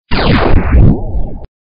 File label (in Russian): udarnaia volna posle vzryva at bomb